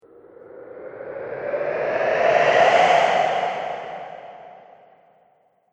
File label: Whoosh Air Build Up Slow